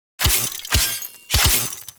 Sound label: Death By Sword Hits; The New War; Lua Valley Ext; Sentient; Cinematics